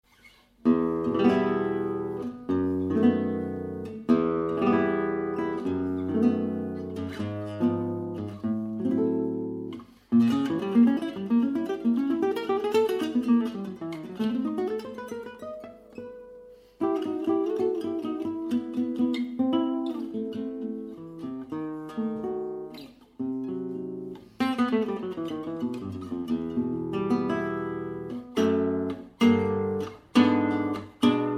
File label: Selmer Nylon